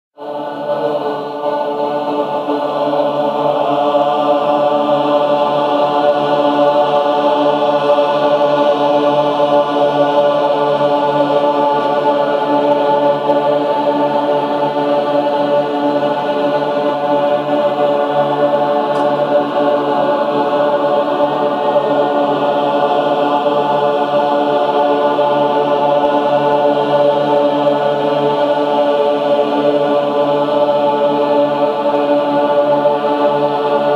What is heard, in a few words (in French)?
Chant druidique de l'Awen; meditation avec l'Awen